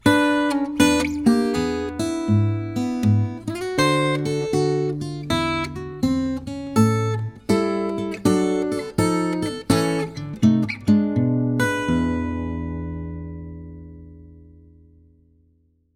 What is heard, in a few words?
Turnaround in A